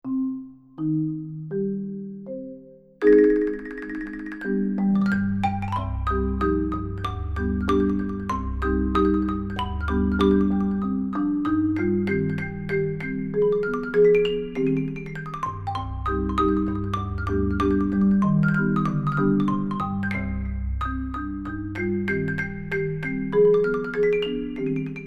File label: jazz, marimba